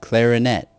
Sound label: clarinet